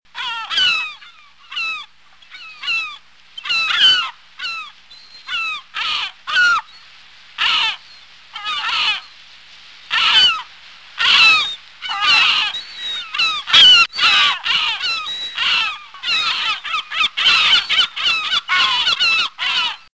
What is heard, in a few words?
Kelp Gull